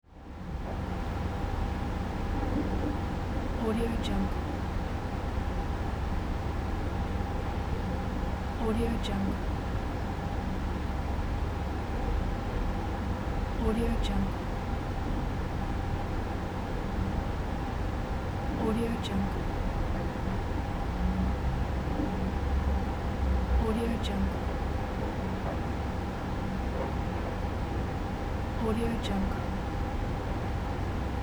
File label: room ambience